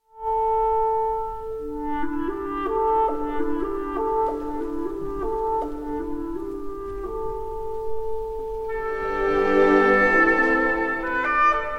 sonata in c major for cello and piano 1st movement andante allegro; op 102 1